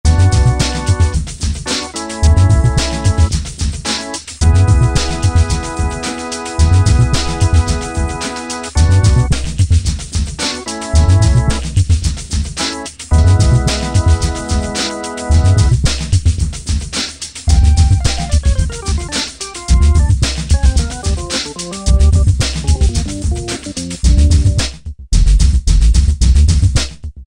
Smoker, Alesis Z1 Hip Hop

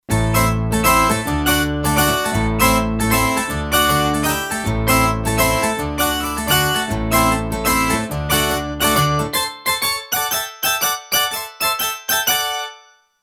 Quillmeister, Kurzweil K2500X

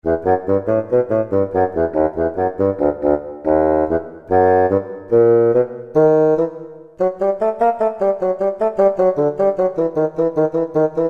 Bassoon, Roland SR-JV80-02 Orchestral

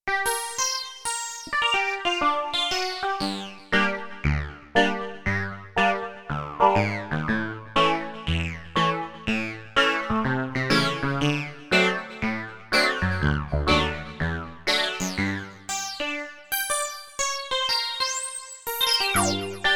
Hills Lead, Korg Radias-R

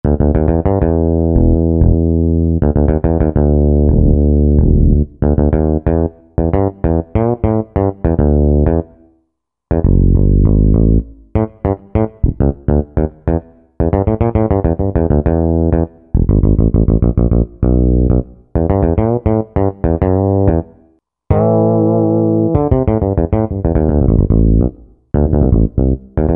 Jacobass, Korg TR-Rack

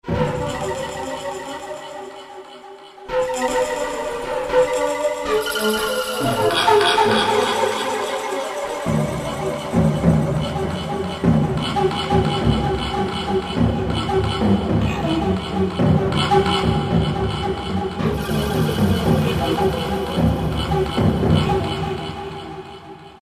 Dense Forest, Roland PN-D50-04

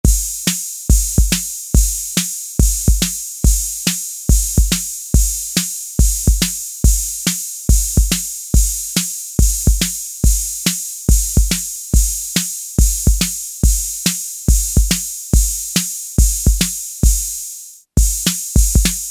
BOOGIE, Roland TR-808; Factory

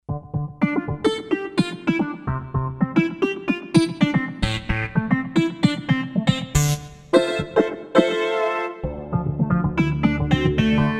OtaStack, Kawai K5000S

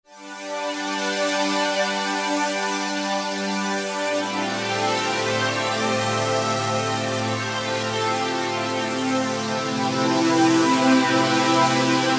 Brite Flange; Roland SRX-05 Supreme Dance

